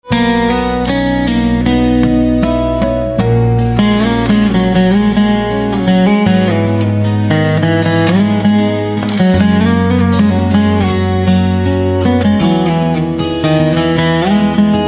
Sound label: Guitar